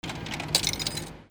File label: PHONES